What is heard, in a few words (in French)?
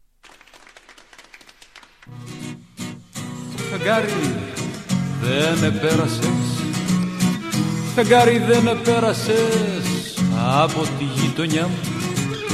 Pentosalis, Grece